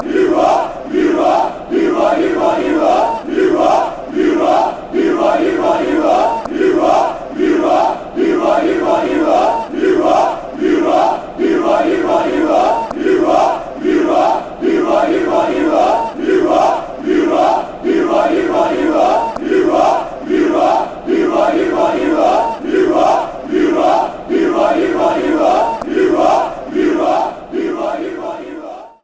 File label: you what fanchants; wfc